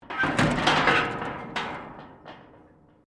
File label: metal rusty move; Materials